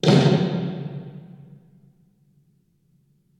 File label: snare